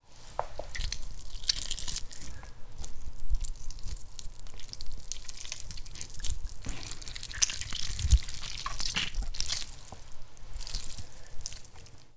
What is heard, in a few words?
Chicken Carcass Mangling